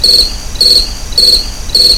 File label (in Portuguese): grilos da noite